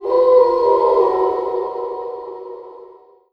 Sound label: dance howl, halloween; Garry's Mod; tf2